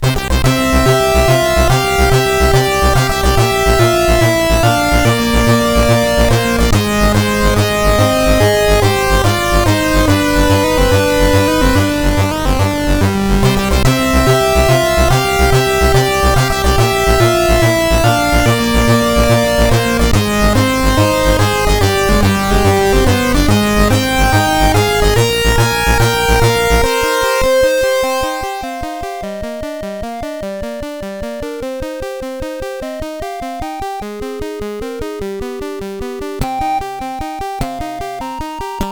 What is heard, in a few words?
Victory, sid CSG8580R5; hvsc